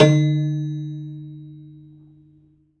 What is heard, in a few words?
Rubbery Piano, prepared piano; Total Piano; Rubbery Piano 2 -ped